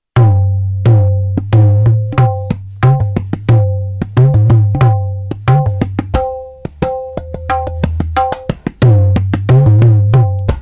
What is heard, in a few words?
tintaal madhya 90bpm